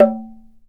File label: CUICA, World Percussion; Synclavier Percussion; World & Orchestral; ILIO